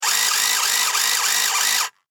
Office Pencil Sharpener Electric Rev Long; Pencil Sharpeners

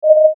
MOUSEOVER, Mouseover Computer Buzz Warble Tone; Multimedia; Blastwave

